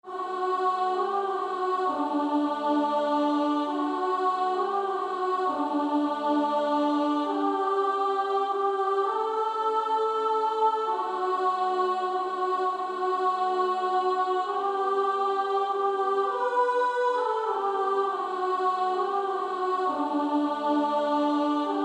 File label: Female Choir; 2nd Soprano; SSAA